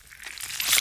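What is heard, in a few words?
attack airship vibe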